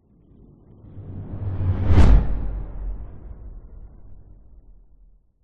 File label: sound design whoosh impact hard wind; zapsplat